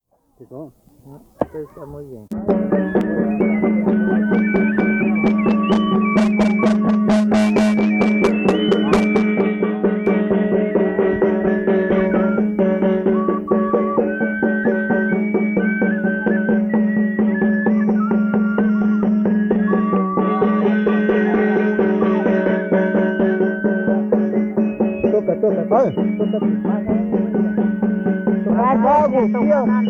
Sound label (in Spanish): MUSICA SHUAR; BLOMBERG 1963